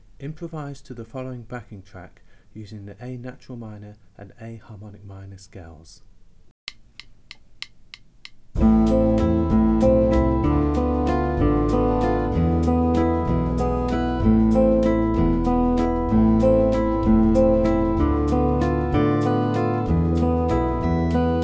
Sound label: Strolling Along Impro Backing